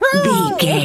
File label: dog hurt whimper howl